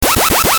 retro; maoudamashii

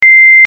retro; maoudamashii

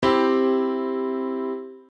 instruments piano c chord; maoudamashii

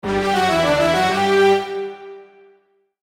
jingle; maoudamashii

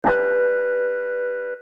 magical; maoudamashii

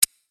switch; maoudamashii

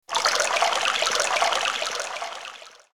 element water; maoudamashii

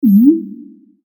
magical; maoudamashii

